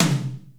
Hi Tom, Zoom RT-234; 300 Drum Machines; Kit A

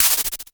NOIZ MOD, Dual-Percussion-Synthesiser; Klone; KLONE FX; Drum Machines

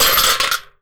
JUNK, Anime Sound FX; COMIC

